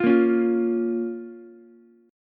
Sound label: F Suspended 4th Sharp 5th, down, Ukulele; Fsus4#5 strummed; JGuitar